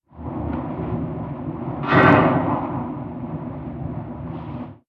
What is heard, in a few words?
ambient port default; World Of Warships